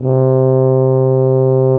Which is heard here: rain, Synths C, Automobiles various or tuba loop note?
tuba loop note